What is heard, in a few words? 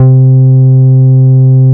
JUNGLE BS C4, JUNGLE BS